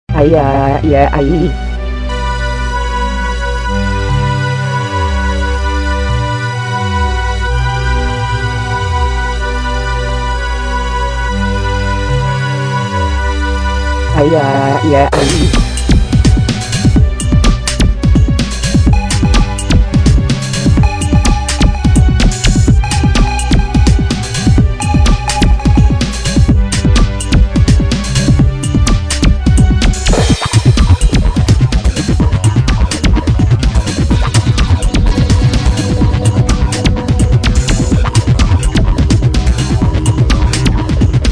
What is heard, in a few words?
trance